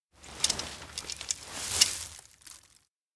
pickup poop bag; anemoi

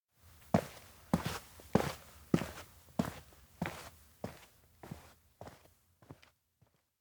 steps outdoor to plane walk; anemoi

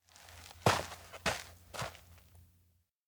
steps outdoor snow plane dismount; anemoi

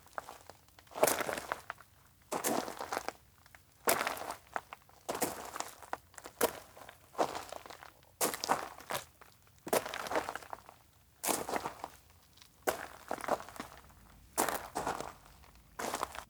steps outdoor cemetery walk slow; LOOP; koyomi; anemoi

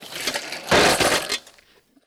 bicycle crash; anemoi

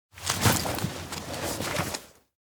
bag takeout manuscripts; kurumi

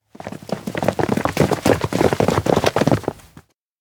steps indoor walk men thud; meguriai; anemoi パッケージ版 1.1

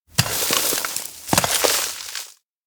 shovel fill hole; anemoi

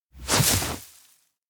kneel wheatfield; anemoi パッケージ版

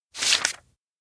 note page turn; anemoi

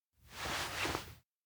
gaze wander; awayuki; anemoi パッケージ版 1.1

